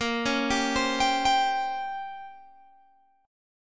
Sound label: Bb Minor 6th Add 9th Bb down Guitar Open G